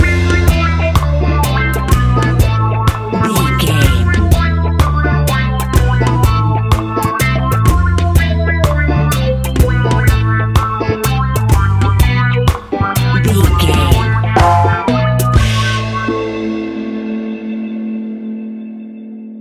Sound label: slow and sexy reggae vibe music, Classic reggae music with that skank bounce reggae feeling